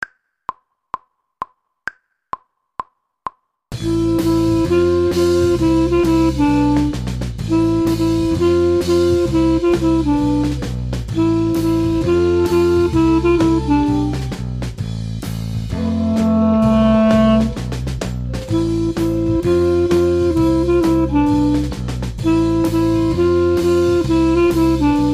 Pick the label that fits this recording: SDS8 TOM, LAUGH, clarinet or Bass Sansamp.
clarinet